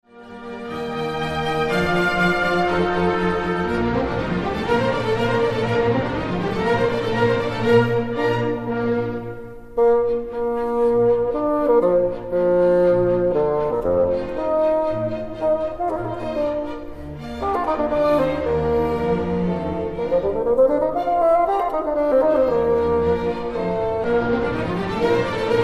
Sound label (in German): Fagott Konzert; Stuttgart; Pro Musica